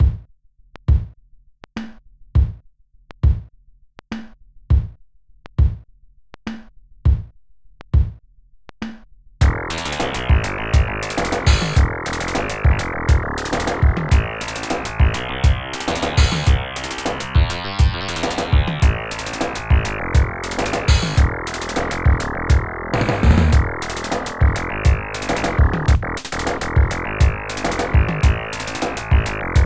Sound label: YM2612; ９時間９人９の動脈瘤; Arabic Nokia Zone Act 1